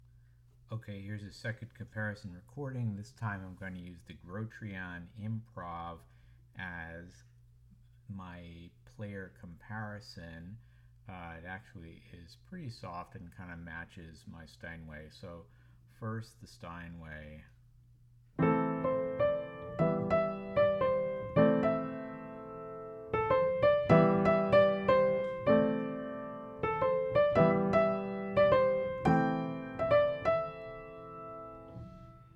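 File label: Blues, Pianoteq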